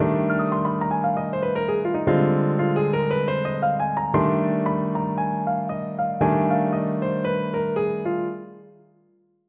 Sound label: blues piano; blues piano tricks